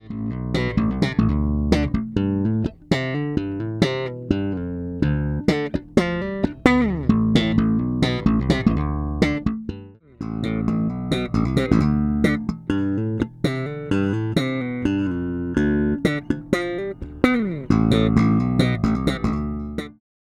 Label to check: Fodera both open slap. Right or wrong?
Right